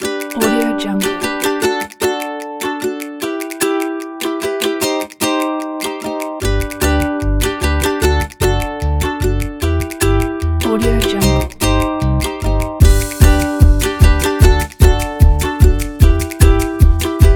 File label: funny music kit